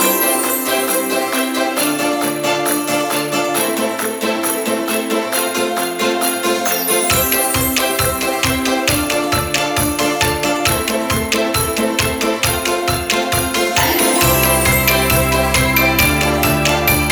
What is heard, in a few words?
Acoustic Christmas; Meow Motors